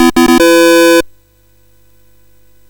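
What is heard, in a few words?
Mountain King, MOS 6581 SID chip music, games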